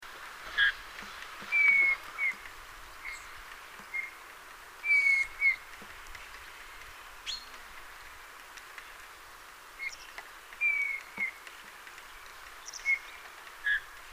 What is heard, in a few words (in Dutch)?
Goudvink Pyrrhula pyrrhula europoea